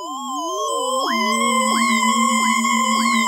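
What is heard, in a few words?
H2O PARTICLE; Pro-Rec - Synthsonic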